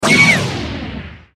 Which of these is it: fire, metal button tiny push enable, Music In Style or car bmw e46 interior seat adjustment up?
fire